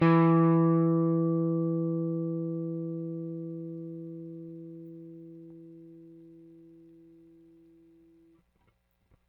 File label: Rick, Rickenbacker Bass; Airwindows